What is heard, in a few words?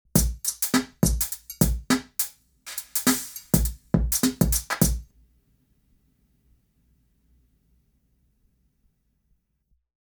newgrange drum